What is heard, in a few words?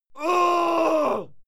male fight scream pain; schreie; menschen; soundpool